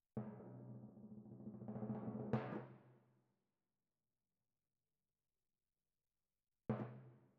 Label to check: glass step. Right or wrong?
Wrong